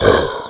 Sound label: boss lite roar